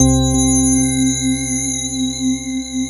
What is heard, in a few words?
CRYS ORGL C3; ORGEL; Synth